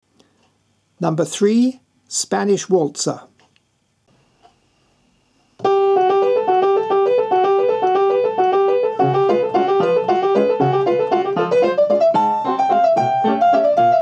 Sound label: Spanish Waltzer